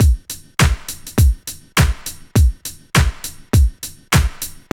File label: house loop